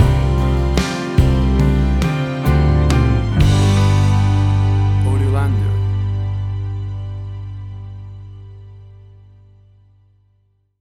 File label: Acoustic Country Soft Rock Ballad Music Stinger